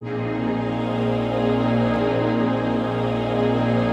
122 BPM Pads Cmaj Amin Tonic A, ambient synth pads; MMB